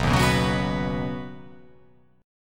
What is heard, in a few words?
B Major Add 9th B, chord; Badd9; strummed; JGuitar